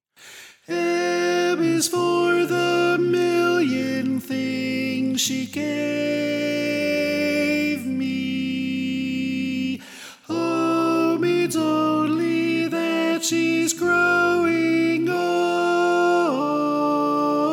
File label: Tenor